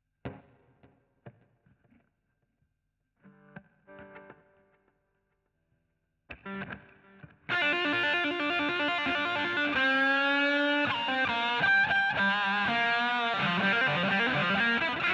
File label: GTR A Solo